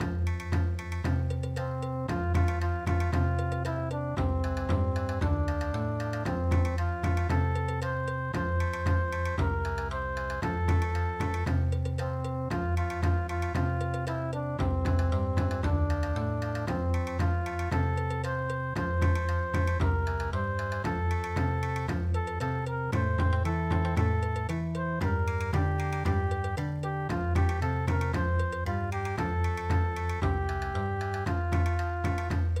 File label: Bon Odori